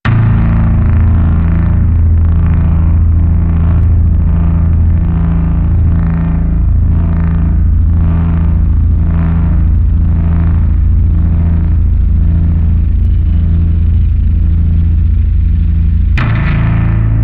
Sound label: Deep Dark Bass